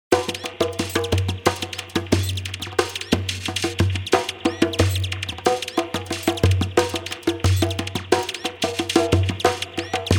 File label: Djembe Noise; Drink To The Pretty